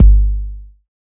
fluffy kick g key, kickdrum sound tuned to the G note. Loudest frequency: 77Hz